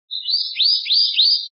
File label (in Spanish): rep silbidos, pardillo